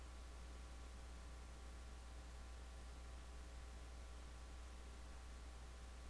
shoot

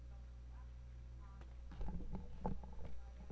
buzz

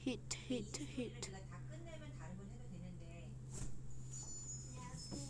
hit

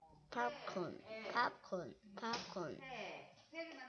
popcorn

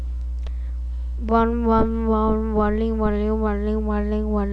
warning

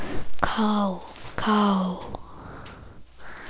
cow

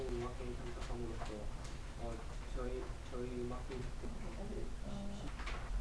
metal

